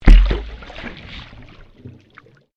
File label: Underwater Splash Impact Large, Submerged impacts created by dropping a weighted rope into; Submerged impacts created by dropping a weighted rope into the ocean